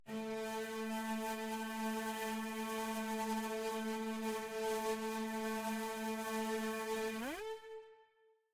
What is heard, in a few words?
Note Bad A2; Forest SFX